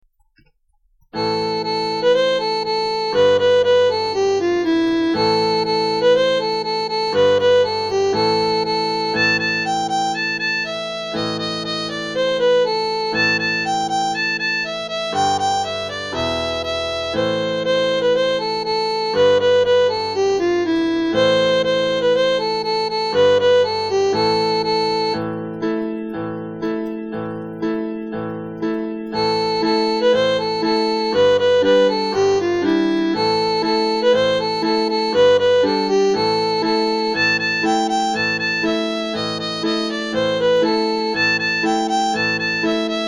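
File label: Gypsy Song violin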